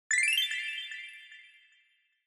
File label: Scene Change High